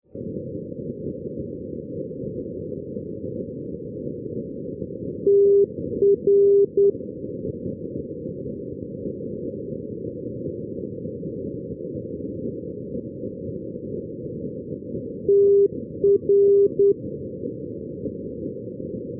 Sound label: ndb tirana rinas; tr-290